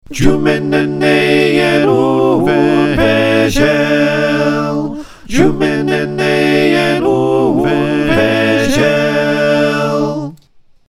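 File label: soprano alto tenor bass, choir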